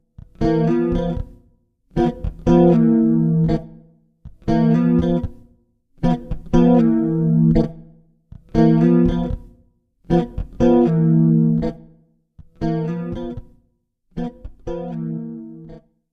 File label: CHORDS STEREO EQ PITCH; Suhr Classic J oly white